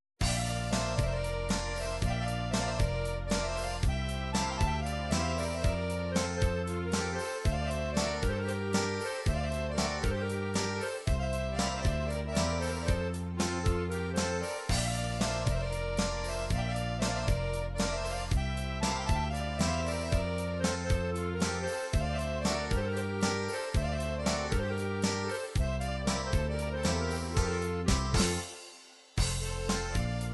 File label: folk makedonia narodno, midi